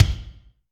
Kik Amb Thud, Drum Machines Alesis D4

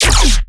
bass hit chonk lazer E; Virtual Riot

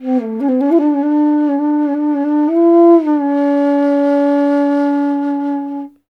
INDIA BASS, IND BASS; Spectrasonics; Heart of Asia; IND BASS PH

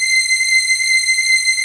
PAD, Dance Mega Synth

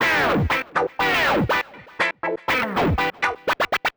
C 121 Dry, MELO + FILTER; IrishCoffee; RoBKTA Sample Pack Supreme

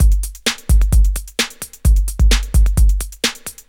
drt 130 electro, DRUMLOOPS, LOOP ELECTRO; Ueberschall; Techno Trance Essentials

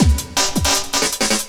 LOOP, 160 BPM, Drum & Bass

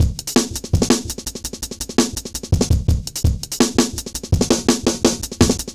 Jazz Shuffle, Drum Loops; Jungle; 166.5; Zero-G Jungle Warfare 1, 2 & 3; 90 s Sample CDs

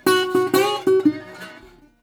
120, Blues Guitar; ILIO - Fretworks

